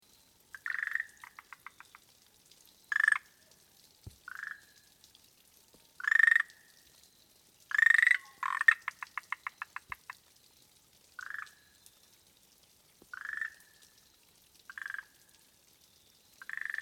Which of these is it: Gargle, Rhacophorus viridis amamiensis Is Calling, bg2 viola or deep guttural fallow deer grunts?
Rhacophorus viridis amamiensis Is Calling